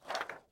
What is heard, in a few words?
image change effect